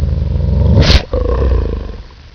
wolf attack; svencoop; legends

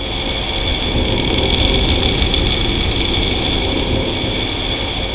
amb tiberium gas; svencoop; commandandconquer

